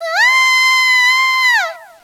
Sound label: ahhhhhhhhhh; DS; ML BOWSERSINSIDE; Peach